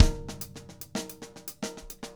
110 LATIN, drum

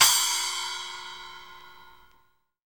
SPLASH, Drum Kits

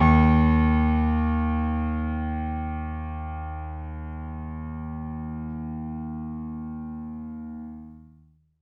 MIX MONO, Acoustic Piano; InVision Interactive; Miroslav Vitous

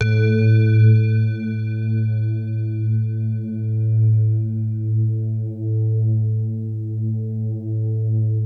CRYSTAL A1; Music Store - Claudius Diemeter Schwerlos; FINE ART 2

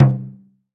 Alt Drum; Multisamples; Digital Sound Factory Planet Earth

